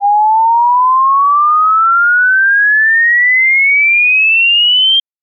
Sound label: sweep 5s 800Hz 3200Hz; CESI; LaSpezia